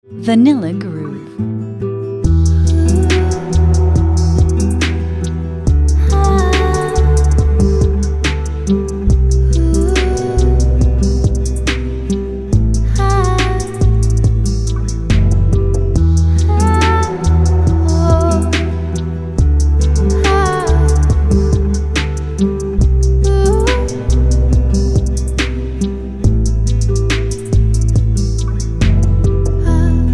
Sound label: Bittersweet Tones